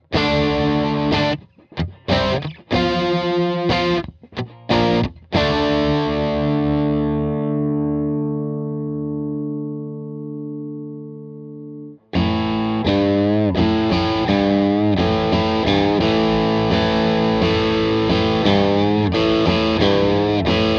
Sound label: bridge dirty, custom pickups